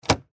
flipper, pinball

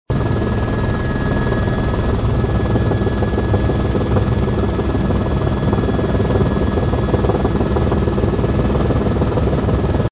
helico; SOUNDDOGS; 3dcity

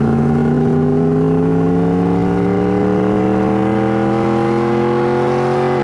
engine, racing

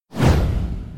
out hole, pinball

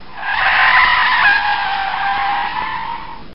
brake, racing

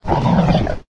zombie angry

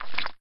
hole, minigolf

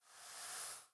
sand, minigolf

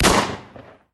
tap zombie

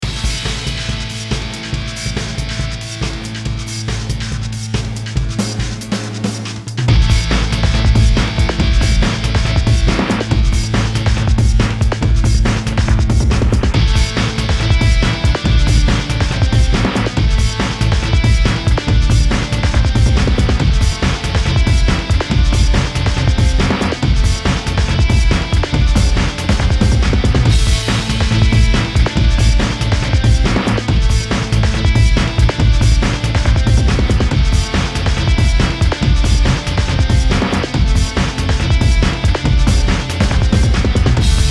game soundtrack, racing

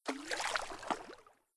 water, minigolf

